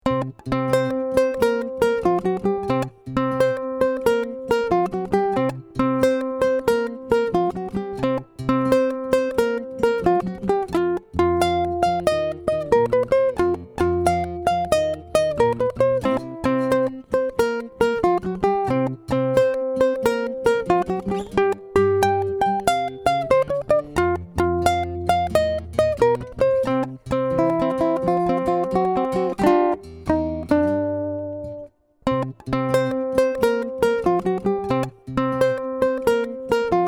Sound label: Blues Shuffle in C, Blues Ukulele; Blues Shuffle Exercise Key of C